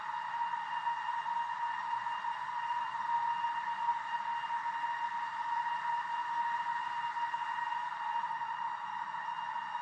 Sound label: High Action